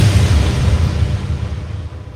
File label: boom